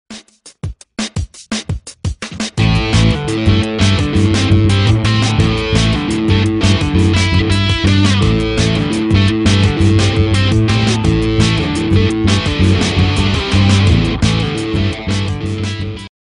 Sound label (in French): Riff